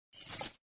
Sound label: Max Bet